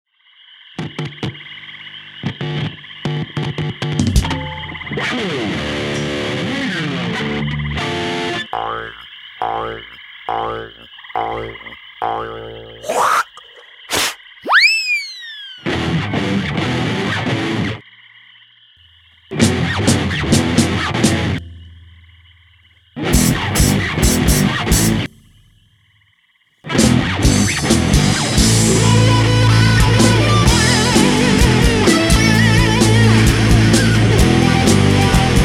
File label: rhytmic acid